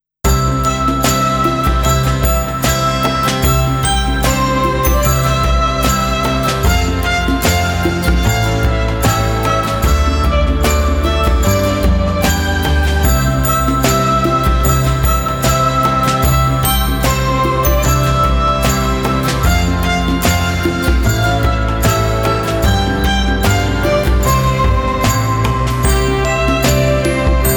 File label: JINGLE BELL (EVENT BGM); Alice Gear Aegis vol.2